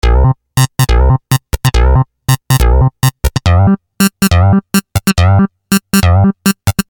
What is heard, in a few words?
Syn-Bass m C, Techno Synth Loops, 140bpm; Sonic Foundry (Sony Creative Software); Signals I; SynBs